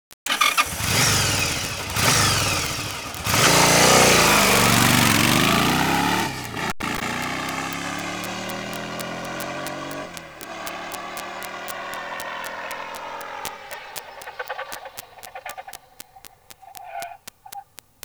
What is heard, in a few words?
Yamaha XVS 1100 Dragstar